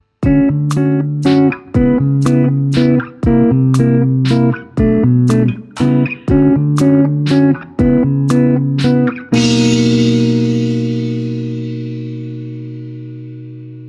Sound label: guitar jingle